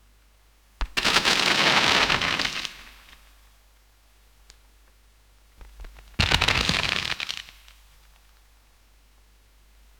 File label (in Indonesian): suara tabrakan ringan; desa